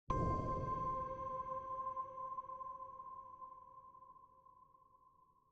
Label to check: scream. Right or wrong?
Wrong